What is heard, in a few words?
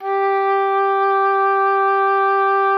oboe, G4; Xsample VOL-6